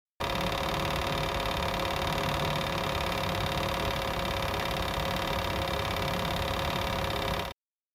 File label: oven fire